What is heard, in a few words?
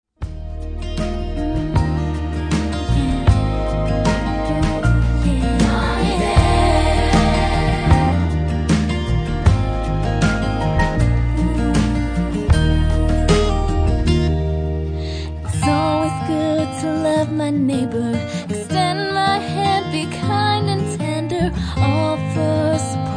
Jingle; tbSchool